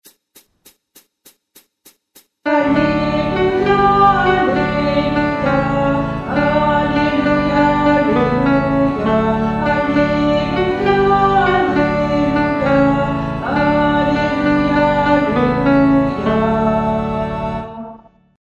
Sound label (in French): Alleluia Guide Chant Alti